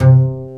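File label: String, contrabass pizzicato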